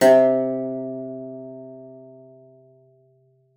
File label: spinet; Xsample VOL-7